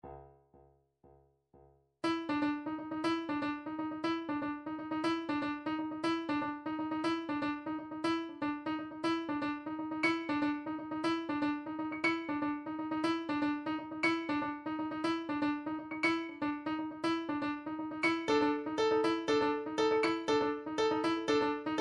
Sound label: drum trax per